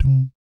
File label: BASS DUM; Spectrasonics; Vocal Planet; Jazz FX